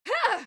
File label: Voices female attack shout; Out of Reach: Treasure Royale